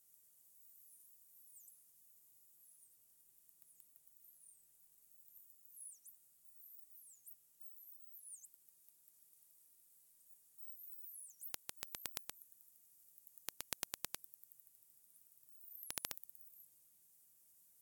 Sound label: dolphin clicks